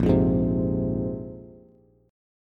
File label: Bb 7th Suspended 2nd down, Bass; Bb7sus2; strummed; JGuitar